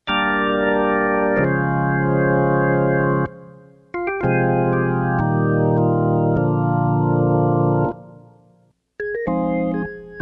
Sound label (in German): Orgeln